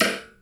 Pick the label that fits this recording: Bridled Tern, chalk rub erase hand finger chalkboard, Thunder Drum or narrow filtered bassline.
Thunder Drum